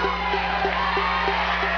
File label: price big wheel